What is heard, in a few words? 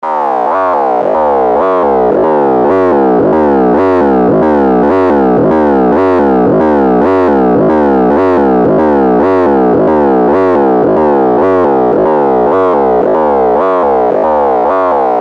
loop 110